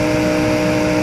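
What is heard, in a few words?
engine exhale, advanced engine sounds; engine2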